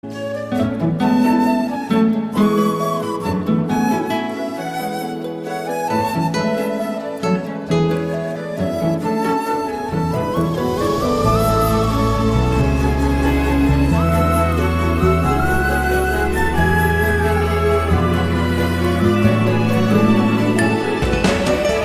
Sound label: Flute BGM